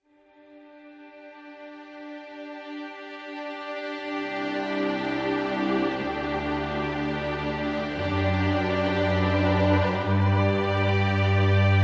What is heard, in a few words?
Vibrant Ambient